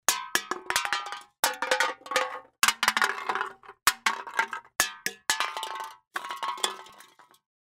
tieng lon bia roi tren san

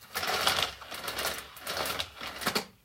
tieng truot day xe do choi tren san go

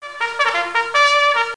âm thanh tiếng kèn kêu

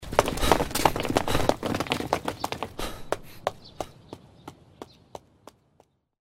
am thanh tieng nhieu nguoi chay tren duong

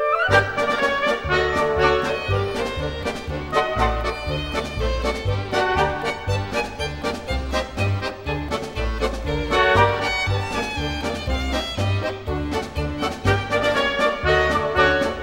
nhac nen video hoat hinh nhip nhang

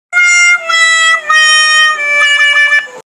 nhac tra loi sai

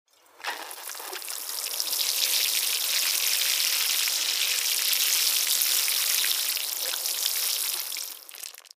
âm thanh tiếng tưới nước cho cây

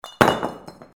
tieng vien gach roi tren mat dat

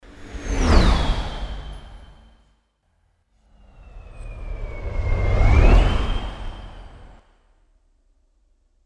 âm thanh chuyển cảnh bay vèo

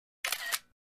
tiếng màn trập camera iphone

